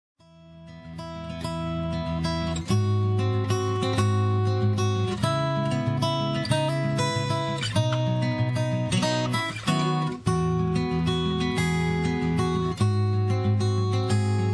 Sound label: lonely instrumental